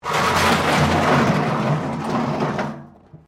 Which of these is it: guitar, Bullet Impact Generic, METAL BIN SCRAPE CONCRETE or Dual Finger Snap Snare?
METAL BIN SCRAPE CONCRETE